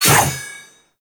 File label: Sword Whoosh; Warframe; Teshin; Weapons